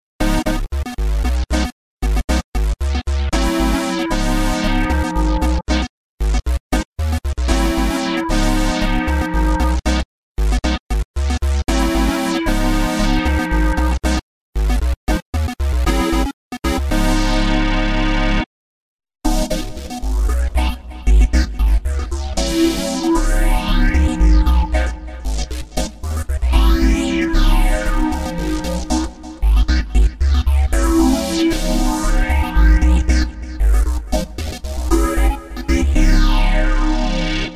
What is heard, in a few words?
Synth